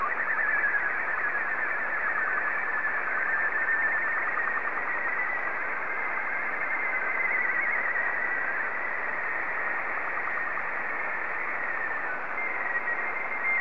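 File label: slow-scan television; R12BW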